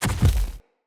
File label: Corrupted Battle Dog Land Step Heavy, Foley Footsteps; Animals